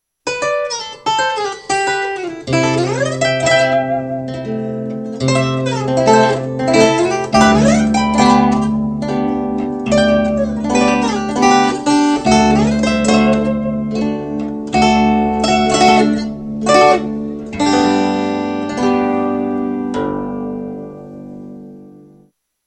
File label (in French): instruments cithare